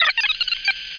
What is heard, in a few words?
sound mouse bot; MOTS